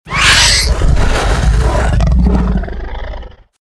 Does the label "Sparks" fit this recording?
No